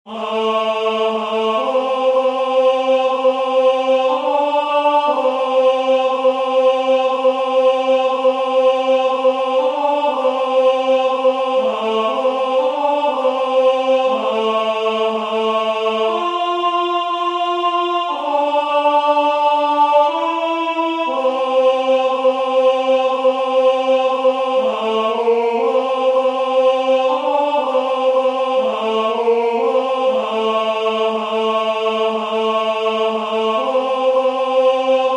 Alta Trinita Beata Tenor; Alta Trinita Beata -anonyme

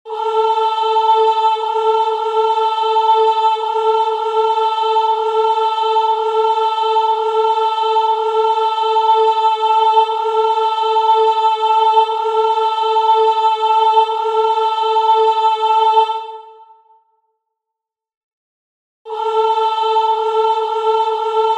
Vepres Sop; Monteverdi; Vespro della Beata Vergine (1610); Deus in Adjutorium; Baroque

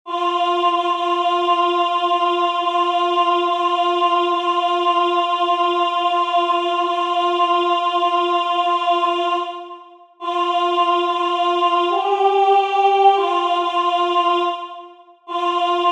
Alto; Gounod; Benedictus

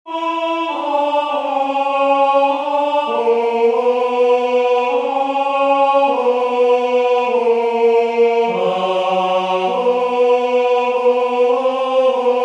Tenor; Bach; Versus VII; Baroque

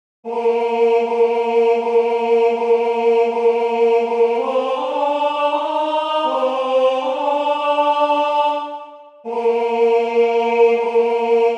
Tenor; Henry Purcell; Funeral music of queen Marie

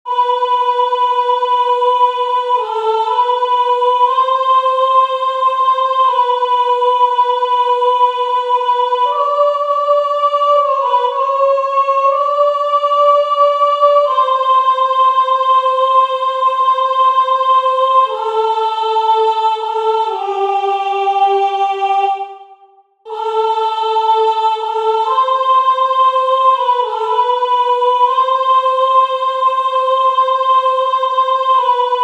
Alto; Costantini; Confitemini Domino

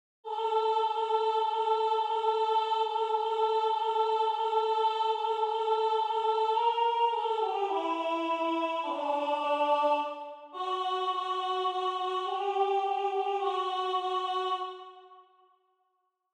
MAGN Alto; Durante; Magnificat

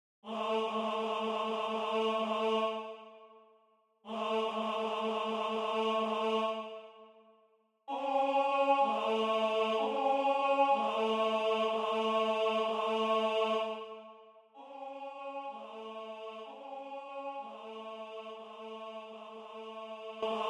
Gloria Tenor; Vivaldi; Antonio Vivaldi

